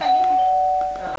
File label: House Doorbell